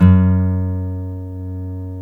folk steel, guitars

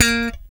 6 STR FUNK; Spectrasonics; Bass Legends

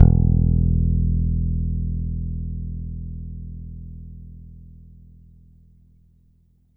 BASS ATTACK, BASS SYNTHES; Big Beat Samples & More

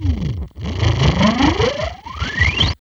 FX, Grunge Hip Hop; USB Soundscan vol.34; AKAI; RNB 101

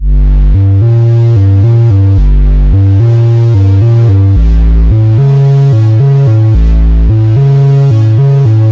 Bass Line; Eclectic Electronica

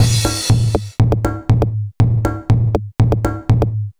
Crash Beat, Composite Beats; Sonic Foundry (Sony Creative Software); Myths of Technology

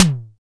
SDS TM, tom; Best Service; Real Mega Drums VOL-1

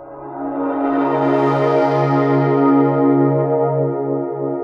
Korg, FX

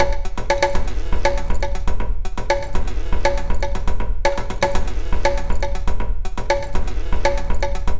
Minimalism, Processed Percussion

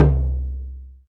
DM5 Perc, Alesis DM5 drum machine

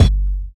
BD; Best Service; Dance Mega Drums

